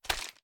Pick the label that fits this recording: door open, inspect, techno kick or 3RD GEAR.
inspect